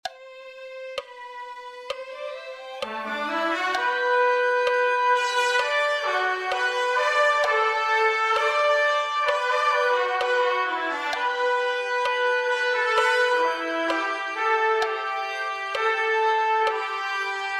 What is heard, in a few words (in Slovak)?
Trubka; Chlieb Co Zjednodusene